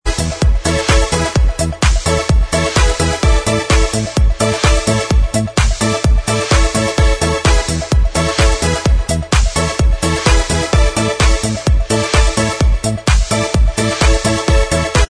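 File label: remix instrumental track, backing tracks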